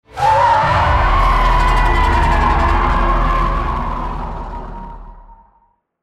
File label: roar; gamera 2023